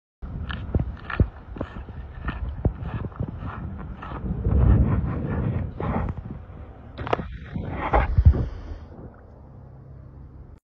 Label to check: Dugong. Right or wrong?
Right